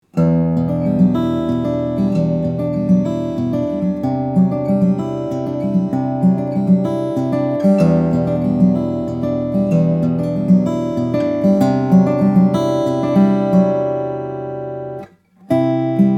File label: x20 opus series, steel string guitar; emerald guitars; black; flattop